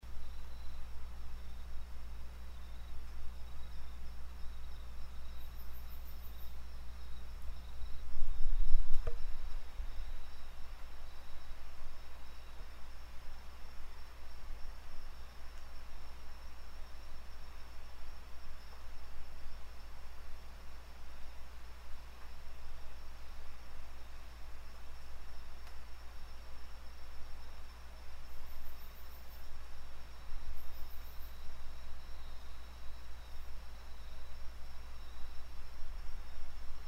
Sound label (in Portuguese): floresta insetos